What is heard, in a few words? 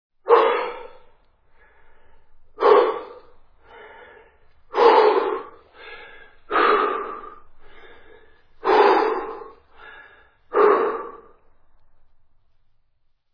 exhale